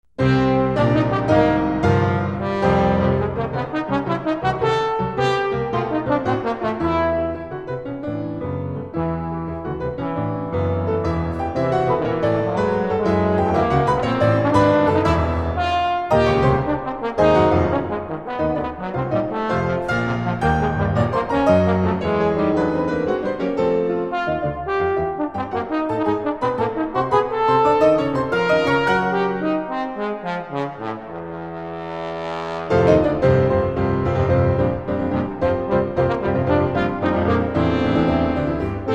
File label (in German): Posaune und Klavier; Trombone; Sonate fur Posaune und Klavier